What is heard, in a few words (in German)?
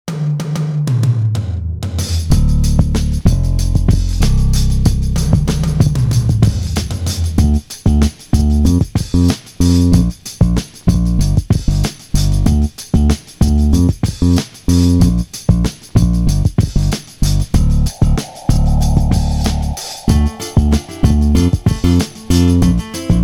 Anlaut Rap 2 Playback